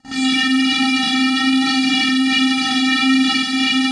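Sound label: square, synth line; 90 s Sample CDs; NiceBeats; SynthLine VOL-2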